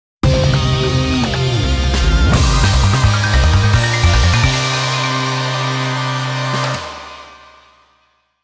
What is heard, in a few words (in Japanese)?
ジングル (戦いのテーマ); fate tiger colosseum